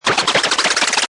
Cartoon Spin, Quick rotate, whirl, spinning around; Comedy/Cartoon; Funny Sounds; Lynne Music